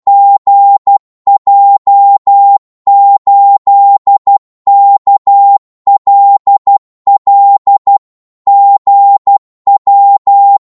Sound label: qso 12wpm, Morse